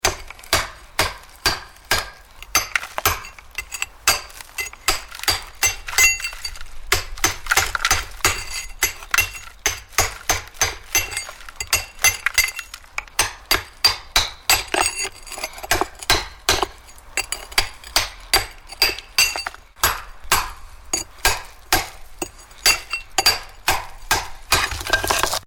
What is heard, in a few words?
Builder chipping plaster and mortar off a brick wall with a sledgehammer; Building Renovation; Hammers